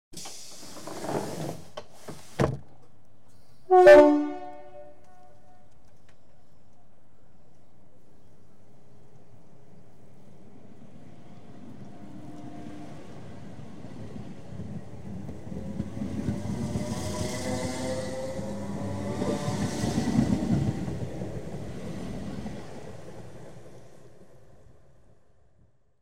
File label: trains ext doors close, hooter, departs; Transport; BBC Sound Effects Library